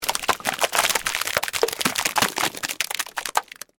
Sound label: Splash Long Splat, Splatter, Mud; Texture; Splashes (Sound Effects:Objects); BlastWave